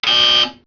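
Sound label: bad buzz; Microsoft Graphics Studio; Home Publishing 99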